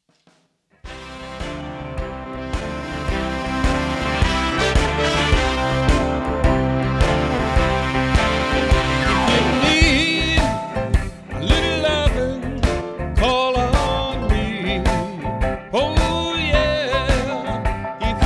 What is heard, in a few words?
Vocal